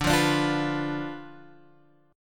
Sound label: D-Minor 6th, D, Guitar, Open G